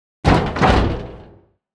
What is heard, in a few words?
robot box open; boss